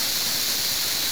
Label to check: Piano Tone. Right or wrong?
Wrong